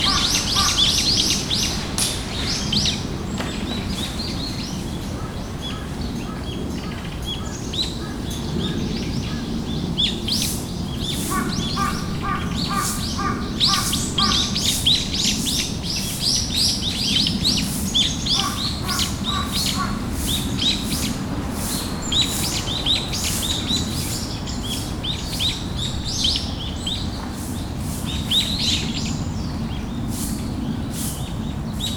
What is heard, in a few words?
bird